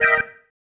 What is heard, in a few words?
Stab One Shots, Organ